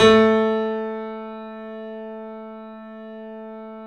3D Boesendorfer, Pianos, A; E-MU Producer Series Vol. 5 – 3-D Audio Collection

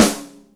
Loosy Rim, Yamaha DTXpress Acoustic Snare

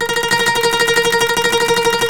TREMOLO, Turkish Oud

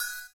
CYM, Ethno Techno; ILIO - Ethno Techno

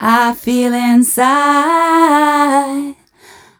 f 132 mel, Vocals, fem

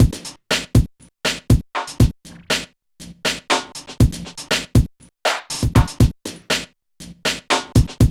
619 GROOV, Boom da Drumz, Grooved; E-MU Producer Series Vol. 6 – Analogia Project

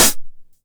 909 HH Tape Sat; Goldbaby Tape808 & Tape909; The Tape 909

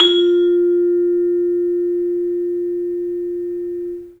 vibes; 3D Sound; 3D Keys VOL-1

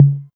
tom mid, Novation Drumstation

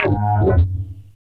SYN, Drum & Bass, 170 BPM; Ueberschall; Drum & Bass Flash Trackz

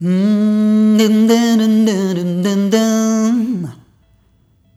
Rhythmic A

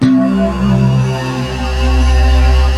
BELLWVE G1; Northstar - New Gold VOL-1

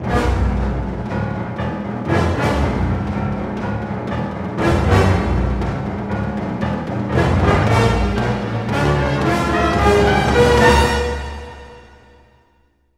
GRO, Orchestral Loops; 128 PREDATOR

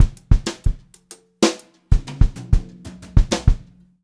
FILL, Brushed Drum Loops

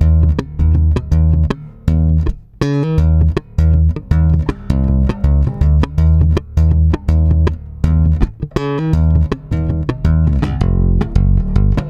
STRUM F, Bass; Spectrasonics; Bass Legends; 88 STRUMMIN

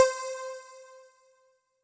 SynPf, Yamaha DTXpress; drum machine

